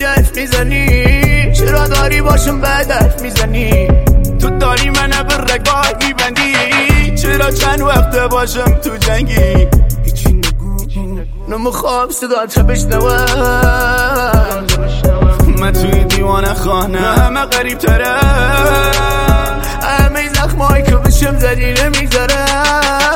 Remix

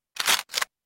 Gun pick up sound

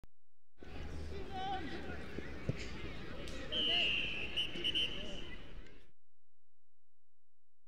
referee end sound, whistle; soccer

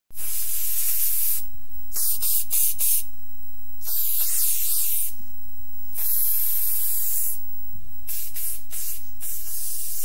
paint spray; اسپری نقاشی

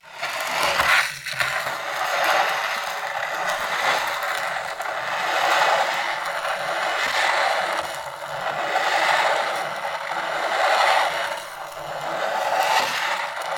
Long Slide Movement

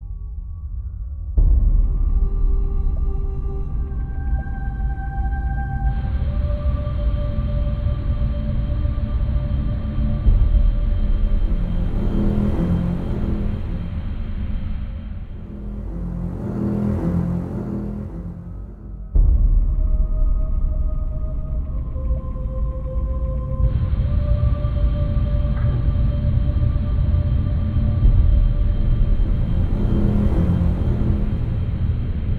scary theme sound

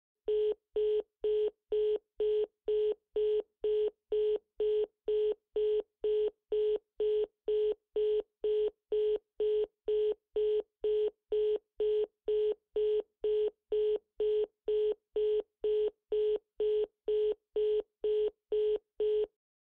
busy phone tone

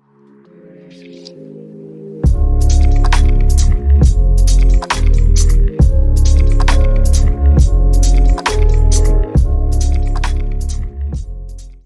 10 second chill music

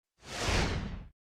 change slide out sound effect, transition

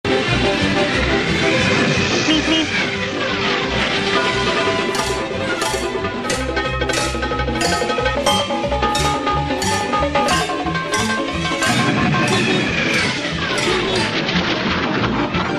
migmig, beep beep; road runner; cartoons

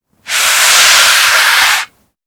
Moving An Object

